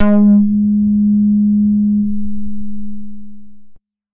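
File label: 90s club bass G sharp 4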